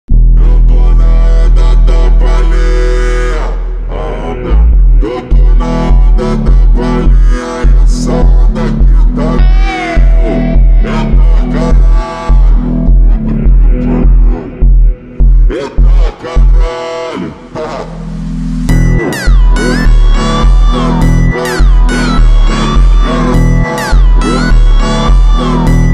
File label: super slowed reverb